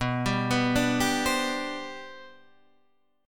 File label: C Major 7th Suspended 2nd B, chord